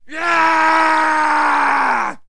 man yell; Mount&Blade Warband

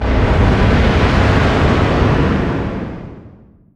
distance ship miss big effect; Hearts of Iron IV Death or Dishonor

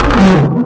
strider pain; npc; Garry's Mod; hl2

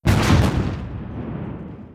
heavy cruiser attack; Hearts of Iron IV Death or Dishonor

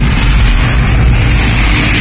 laser burn, stalker; npc; Garry's Mod; hl2

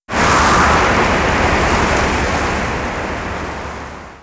stereo gust; ambient, ambience, rainscapes, rain; Left 4 Dead 2

